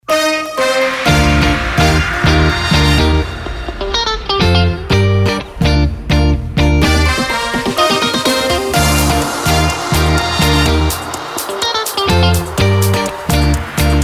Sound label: Show Opener fast; RADIO PRIMAVERA